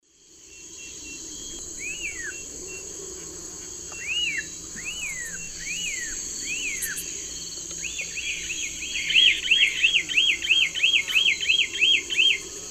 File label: Donacobius atricapilla, calls; S. America; Eastern Lowlands